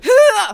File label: Bomb Thrower Attack Vocals